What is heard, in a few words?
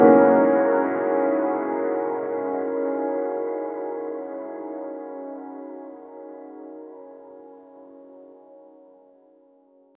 sparse piano notes echoin; Sparse piano notes echoing with long reverb tails